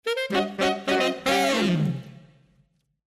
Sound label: Jazz